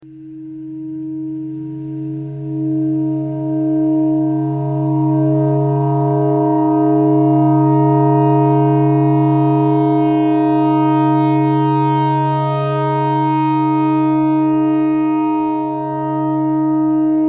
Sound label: clarinet notes e clarinet thread pentatonic